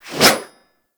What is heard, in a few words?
Axe Small Swing; Warframe; Weapons; Swings